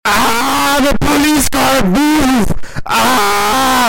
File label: loud siren